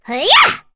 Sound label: Vox Attack; Cecilia; kingsraid; voices heroes